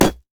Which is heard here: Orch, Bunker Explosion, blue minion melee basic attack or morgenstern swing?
blue minion melee basic attack